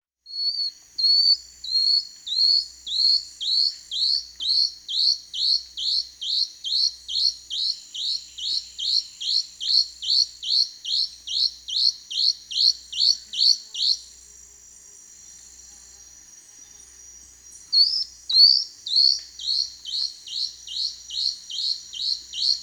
Plain-throated Antwren (Myrmotherula hauxwelli), Peru; S. America